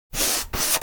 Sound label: Paint brush painting wood two strokes